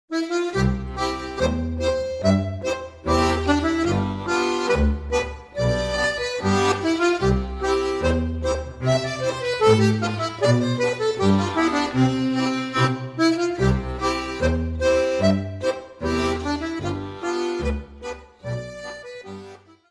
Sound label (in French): Ecossaise - Belgique